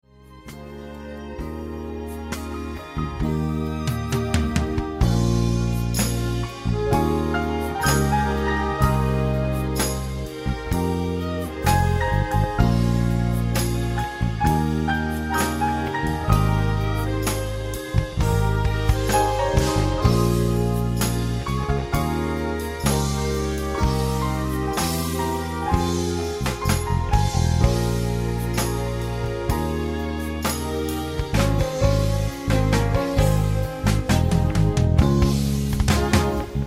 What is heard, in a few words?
Instrumental